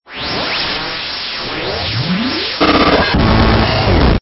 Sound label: Stinger; STINGERS